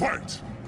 fight start sound; bitfighters

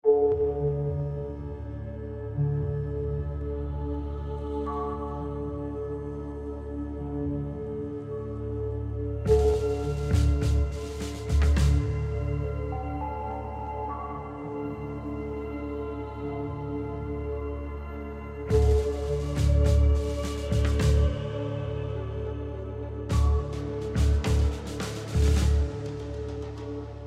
mining

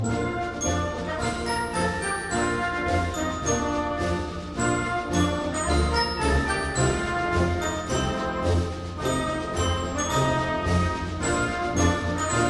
Menu BGM

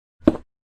Pickaxe; Minecraft

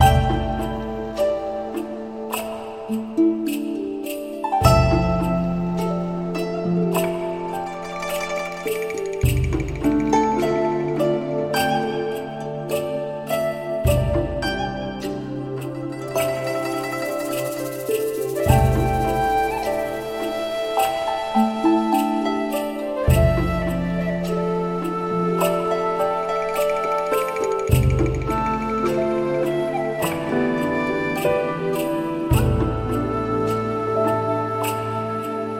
bgMusic; Caishen

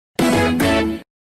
ba dun dun

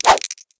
dice Throw; jumanji

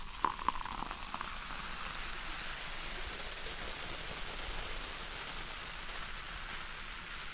soda pour out loop

